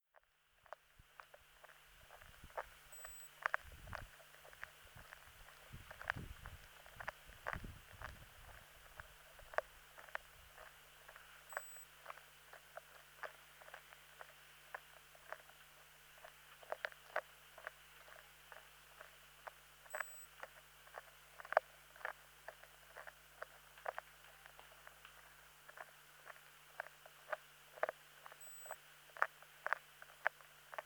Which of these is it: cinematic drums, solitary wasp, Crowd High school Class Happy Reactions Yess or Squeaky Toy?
solitary wasp